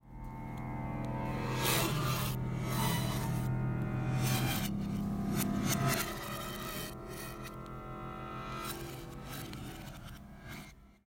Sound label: Piano sample backwards glitch